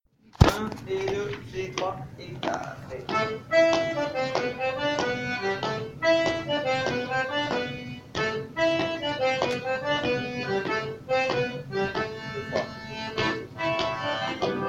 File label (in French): violoncelle; Entre-Deux; Musique d-ensemble